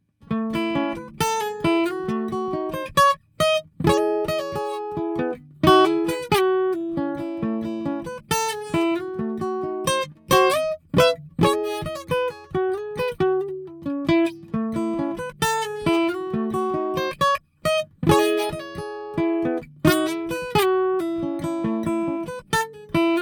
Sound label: Hummingbird Neumann M147